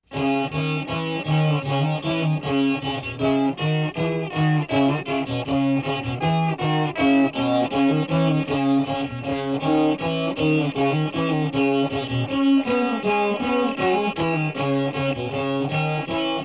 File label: Embryo Instrumental